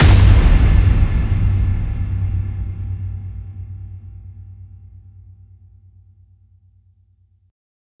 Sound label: HIT Trainwreck; TRAILER TOOLKIT VOL.1